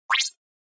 Cyber

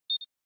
NES RPG Select

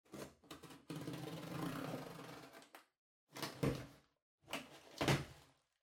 Cardboard Box Far Unbox; ダンボール; 遠い 開封; 梱包材

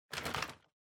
Vacuum Cleaner Noise

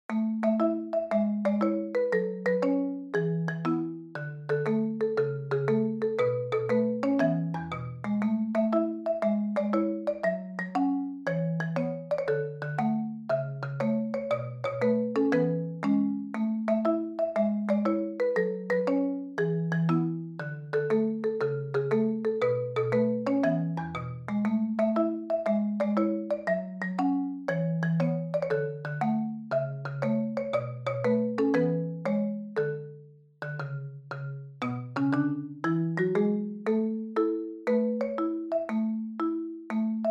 Marimba

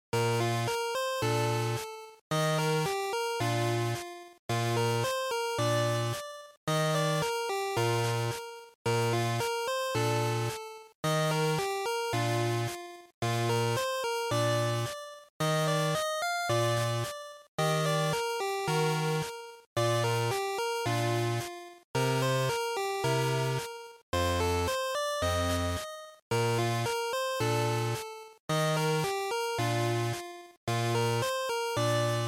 GB RPG Town

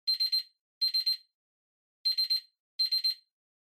Tabletop Timer Digital Alarm Far; 卓上タイマー デジタル01-16(アラーム 遠い); タイマー機器

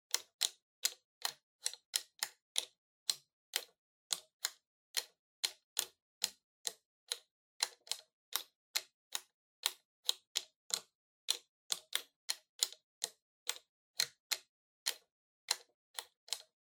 Soroban Slow

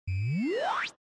Retro Anime Mech Equip Long

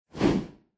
Camera Motion Mid; シーン・カメラモーション

